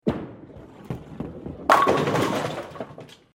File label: bowling strike